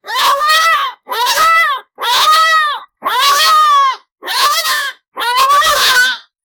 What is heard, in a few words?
angry chimpanzee